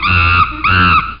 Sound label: Chimpanzee; Nokia E72; WWF